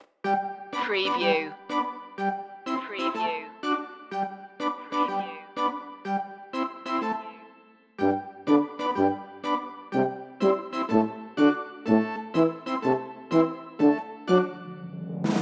candy swirl no bells or blocks

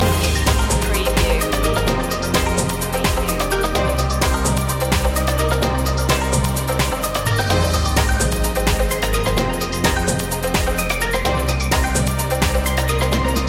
liquid breaks loop

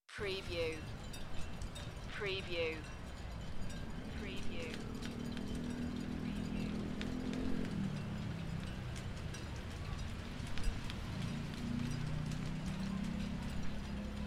Sailing boat masts in the wind; beanstalk audio

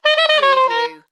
Sax Short Run Down

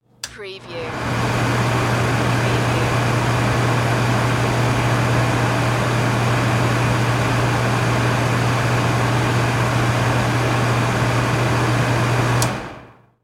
Oven Fan

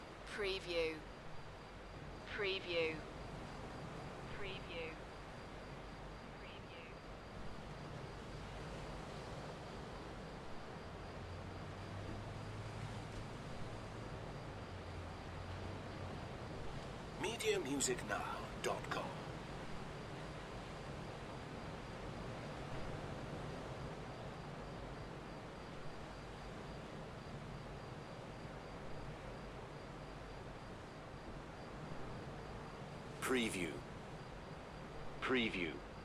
Promenade by night with insect noise; beanstalk audio

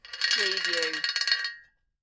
Metal Chain Pulley - Rattling Chains

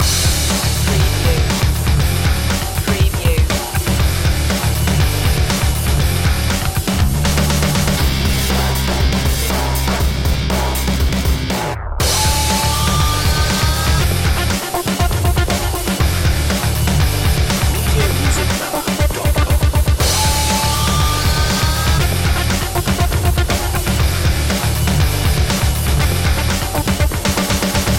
heavy metal showdown loop

